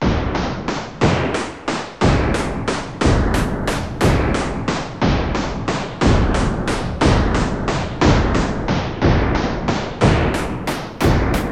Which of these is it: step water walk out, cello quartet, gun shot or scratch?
gun shot